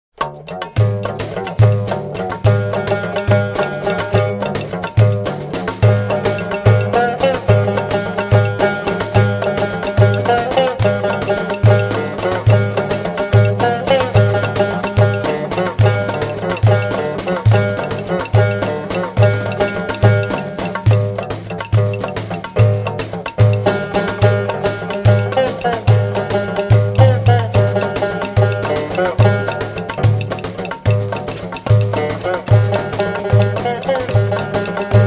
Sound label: Walk Fast